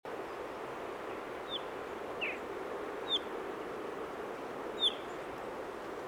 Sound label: Pyrrhula pyrrhula; SETE